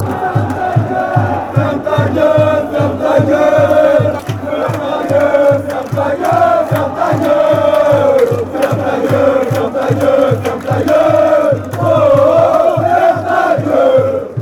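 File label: closed, football chants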